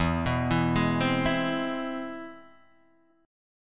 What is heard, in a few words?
C Augmented Major 7th E